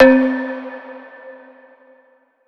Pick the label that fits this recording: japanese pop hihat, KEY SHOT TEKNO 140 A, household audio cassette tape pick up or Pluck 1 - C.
Pluck 1 - C